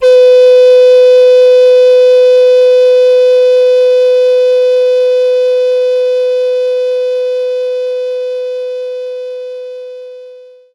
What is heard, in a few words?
Recorder B4